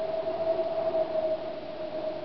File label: Wind